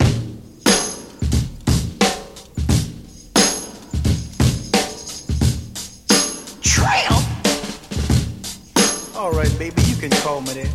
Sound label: 89 bpm fresh drum groove a sharp key